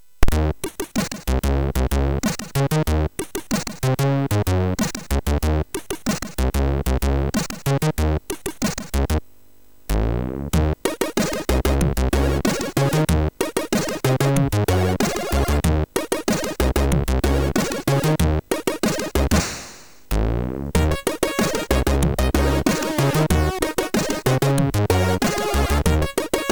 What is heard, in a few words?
Funk, MOS6581R3